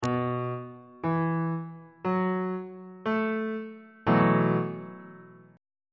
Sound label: b dominant 7th suspended 4th chord on bass clef